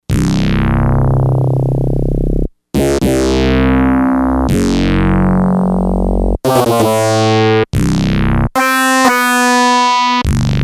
WOW, Yamaha DX100; Factory Patches

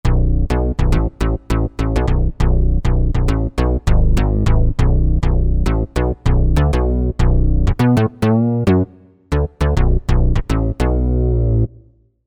Kick up da Bass, synth

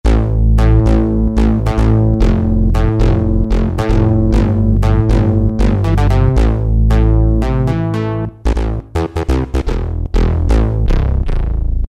BA Syn, Yamaha TG33; Factory Presets

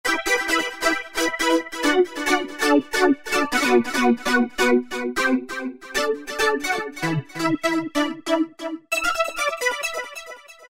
Korg microKORG Synth Pizz; Factory Voices

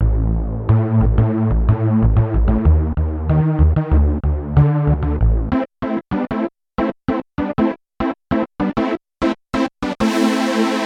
Unison Bass, Korg Radias-R

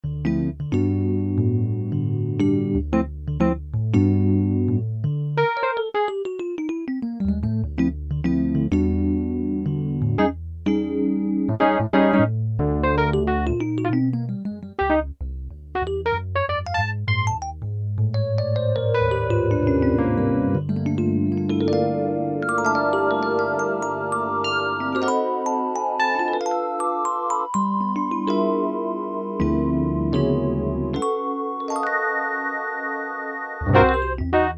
Dual Elec Piano, Kurzweil K2000; Kurzweil K2000 V3

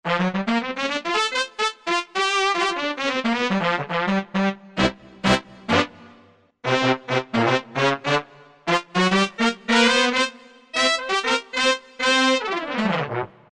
Brass 2, Korg M1; Factory preset examples

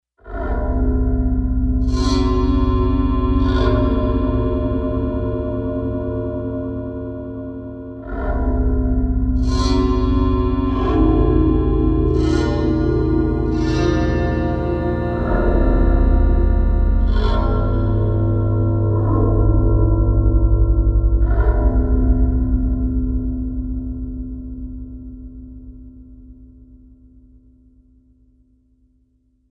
GHOST PIANO; Digital Synthesizer

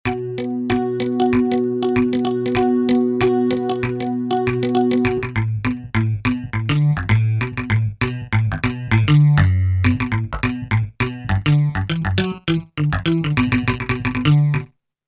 Stratus, Roland JV-880; Factory Patches

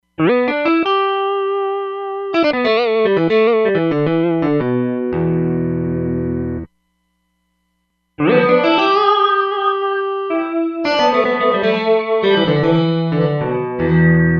Flange Small Room, Roland DEP-5; Factory Patches

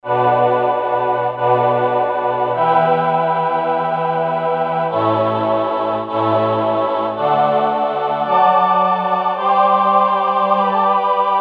VP-330 Choir, Roland JD-990; Vintage Board

